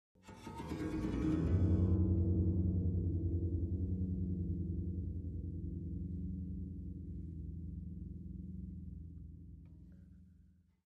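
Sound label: Harp Low Strings Ascending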